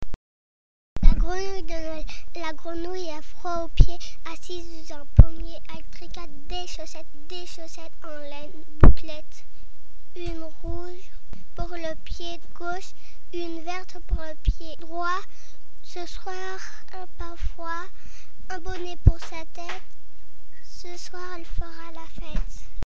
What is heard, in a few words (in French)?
la grenouille de Noel